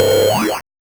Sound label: Noise, 8-bit, Bitty Noises